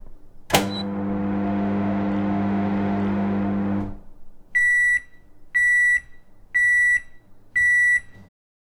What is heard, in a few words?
short beep from a microwave oven